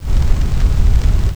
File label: fire; Notrium